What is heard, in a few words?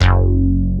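Classic Analog One Shot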